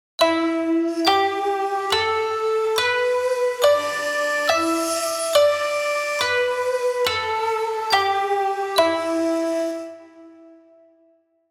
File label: em pentatonic scale